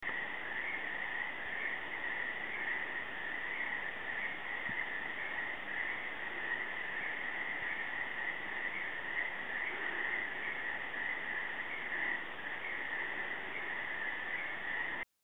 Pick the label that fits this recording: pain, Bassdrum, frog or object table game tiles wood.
frog